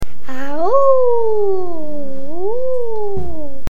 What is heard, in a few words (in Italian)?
lupo timido